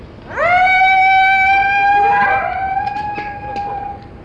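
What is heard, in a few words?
Canis latrans adult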